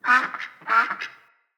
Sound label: Trapped Duck Call; Encounters; Animal Rescue